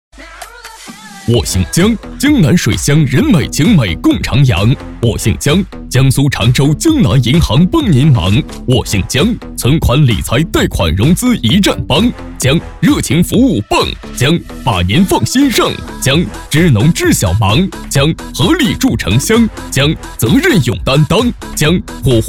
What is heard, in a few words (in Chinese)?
喊麦; 我姓江